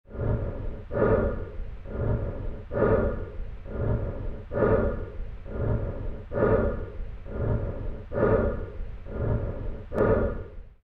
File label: diver